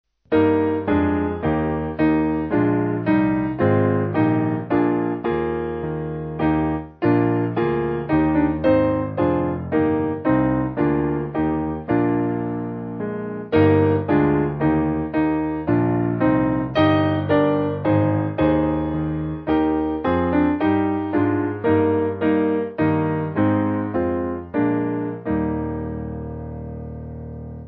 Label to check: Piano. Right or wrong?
Right